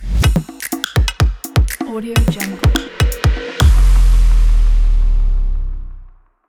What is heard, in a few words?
claps drums step logo